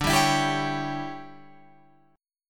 D Major 7th Suspended 2nd Suspended 4th, D, down, Guitar Open F; DM7sus2sus4 strummed